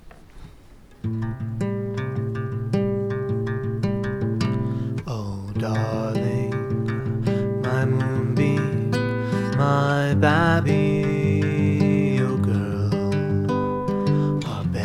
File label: lullaby; for young whelps; weeklybeats 2026